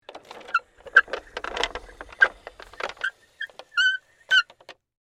Cart Roll By Slow, Industry, Cart, Roll, By, Slow, Squeaky, Wheels; Rolling